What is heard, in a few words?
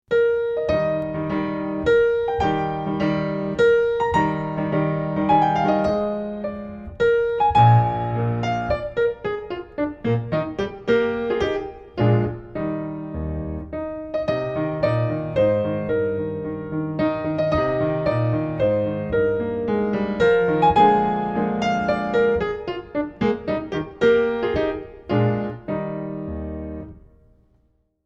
Minuet; Beethoven